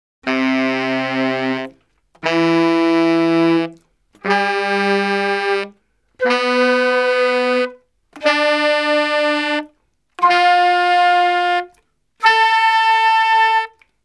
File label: Salsa Picante; Masterbits; World Zone VOL-3